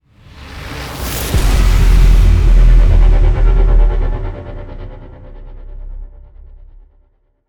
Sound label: Stinger Modulate